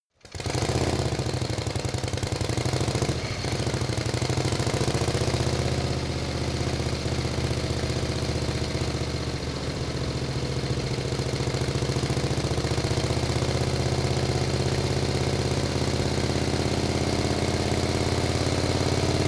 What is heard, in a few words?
motorcycle harley drive medium speed sequence